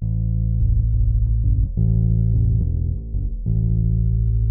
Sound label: BASS